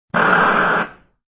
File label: Toy Car Crsh, Toy car crash sound.; Toys